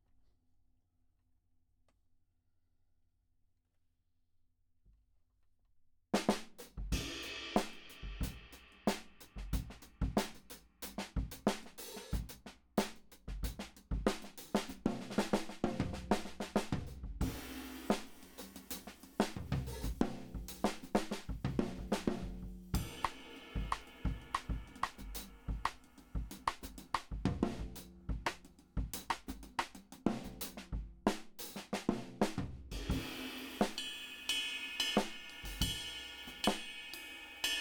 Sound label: Kit